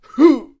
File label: hit; SimplyGladiators